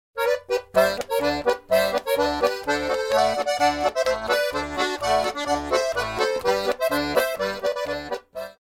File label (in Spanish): acordeon